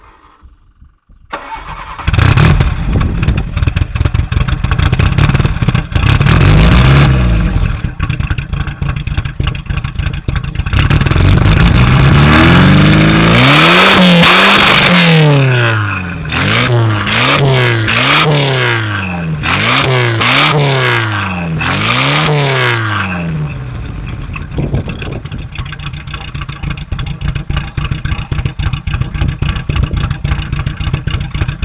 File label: exhaust